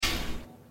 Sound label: Gate Unlocked; Granny 3; Android